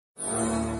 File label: match 4; john carter; lost symbol